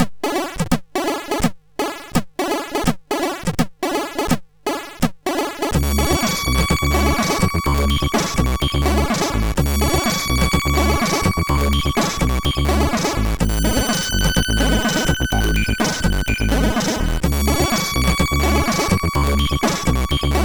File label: Haunted Muzak sid MOS6581